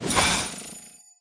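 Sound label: pterodactyl buff; pterodaktilj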